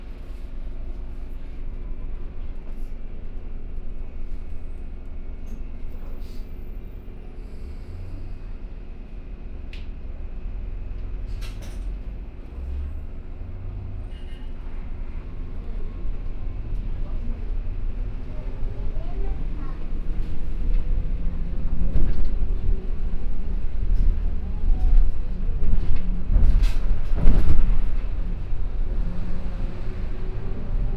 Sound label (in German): Elektrobus (Aceita Passe De Onibus), Brasilien Fieldrecordings